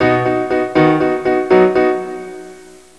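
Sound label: PIANO ARPEGGIO B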